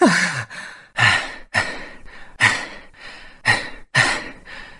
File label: breath female; ooh ahh